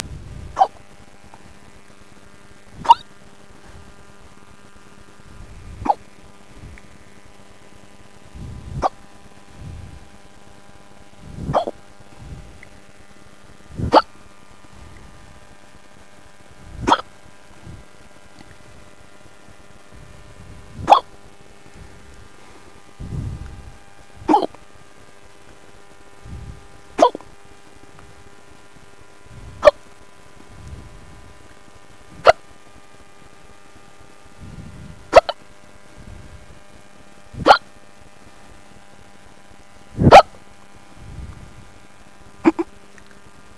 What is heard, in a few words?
Squeak